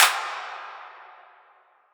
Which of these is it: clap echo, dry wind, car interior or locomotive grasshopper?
clap echo